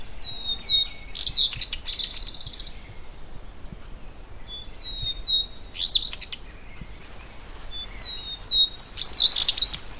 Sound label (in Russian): hodsoniya luscinia phaenicuroides, zvuki peniya ptits